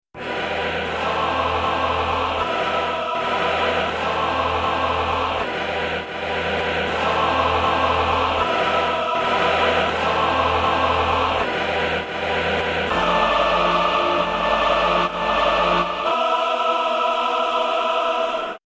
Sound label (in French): choeurs